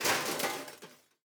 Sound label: metal break; Teardown